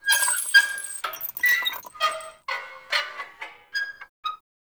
Path Lantern Impact Swing, Hanging Lanterns; Dendrit

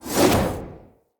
Long Sword Whoosh; Weapons; Mother

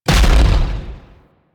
Railgun Explosion; Warframe; Corpus; Corpus Railjack; Biped Railgun

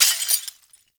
Desktop Glass Destroy; Ambience; Gameplay; Warframe; Entrati

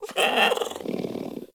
Puppy Idle Purr; Vocals; Warframe; Kubrow

